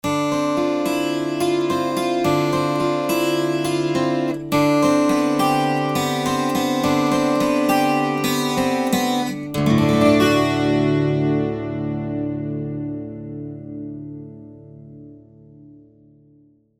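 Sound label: 12 String Mystery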